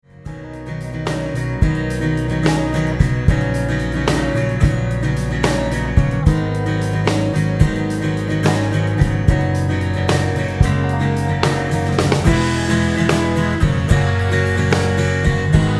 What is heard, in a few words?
Instrumental